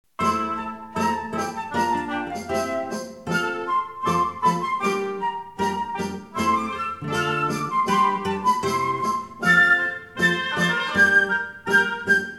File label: Pub Tune